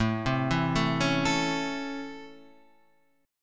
C sharp Minor Major 7th Sharp 5th, chord

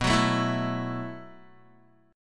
B 7th Suspended 4th B, Guitar; B7sus4; strummed

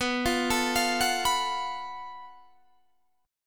Gb Minor Major 7th Double Flat 5th B

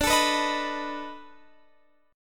B 7th D; B7/D; strummed

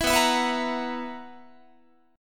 B Major 7th Suspended 4th B, Guitar; strummed

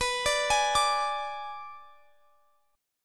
G sharp Diminished B

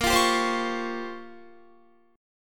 Bb Minor 6th Add 9th Bb down Guitar; Bbm6add9 strummed

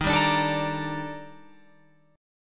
D sharp Major Sharp 11th, Guitar, Open F; D#M#11; strummed

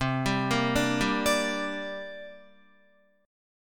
C 7th Suspended 2nd C down Guitar; C7sus2 arpeggiated; JGuitar

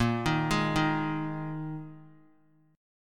Bb 7th Suspended 4th Bb 3C,3F,3A,3D; arpeggiated; JGuitar

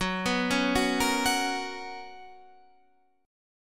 B Major 7th Suspended 2nd F sharp

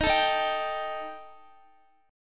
D sharp Major Flat 5th D sharp Guitar, chord; D#Mb5; strummed; x,x,15,14,15,15; 4F,4A,5C,5G,5C,6E; chord shape

